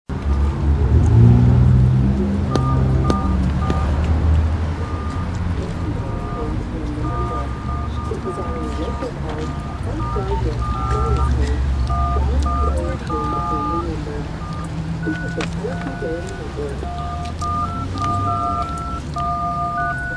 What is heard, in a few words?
punchpad